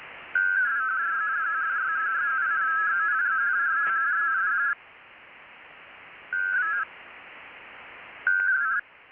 WINMOR 500Hz 2 x 4FSK 46.875Bd, WINMOR HYBRID 2 x 4-FSK 1 x PSK WAVEFORM; (AMATEUR RADIO MODE)